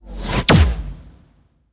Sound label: Electric Engine; blue archive